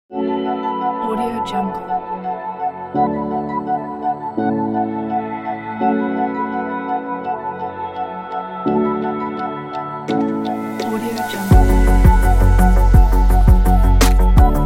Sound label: abstract ambient nature